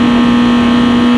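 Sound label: Engines low loop; SuperBike2001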